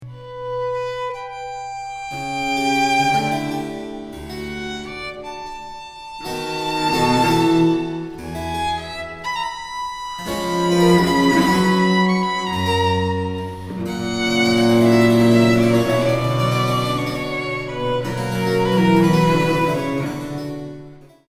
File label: piani